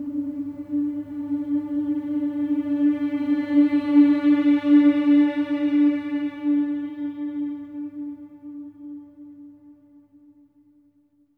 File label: MM D4; SWELL MMS